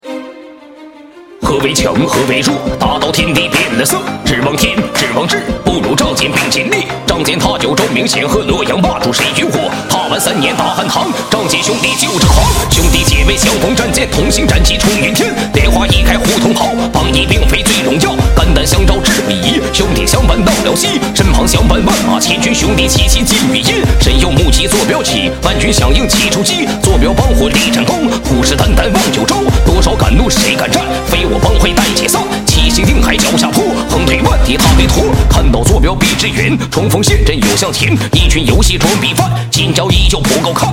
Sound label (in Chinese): 另类战歌